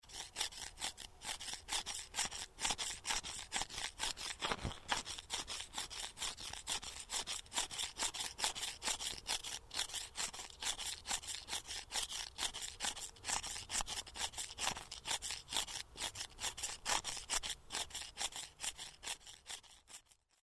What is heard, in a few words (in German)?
gesaege